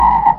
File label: Double Code Frog Sound